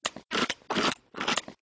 monster chewing

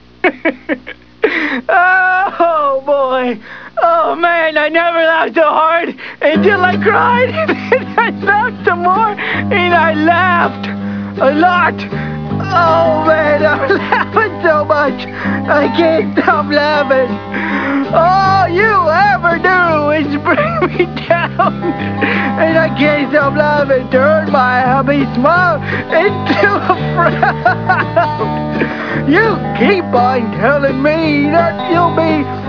laugh

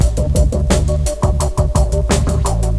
downtempo

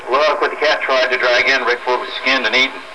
cat drag

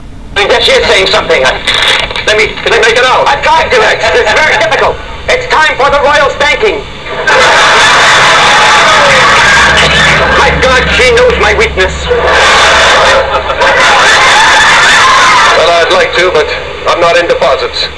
spanking

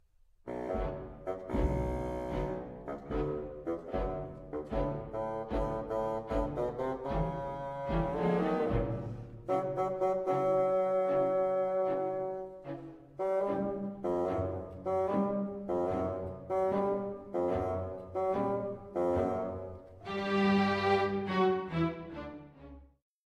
Bassoon

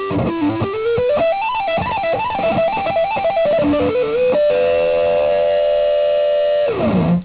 bleeping death

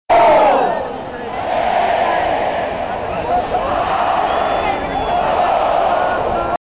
barf

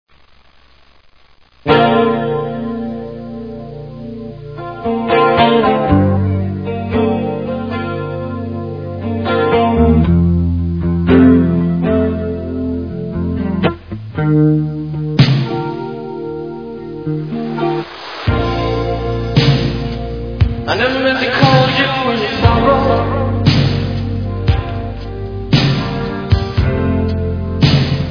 rain

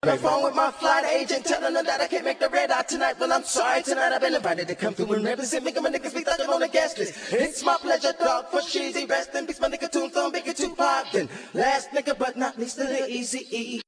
unreleased acapella

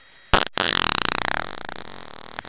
fart

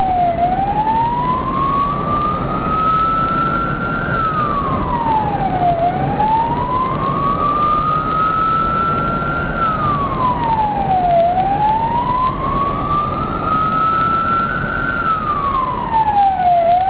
siren wail

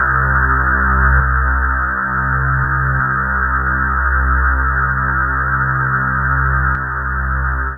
BASS

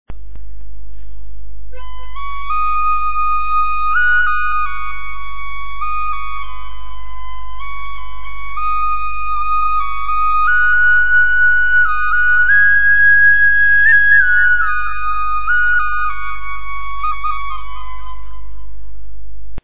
flute

